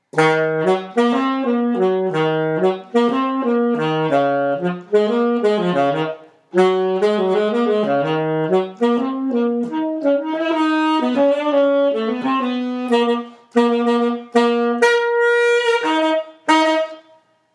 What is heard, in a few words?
tenor latin; jr. high jazz cycle 2